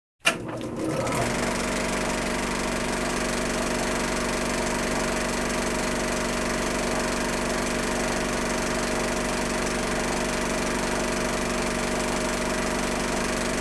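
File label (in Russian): Кинопроектор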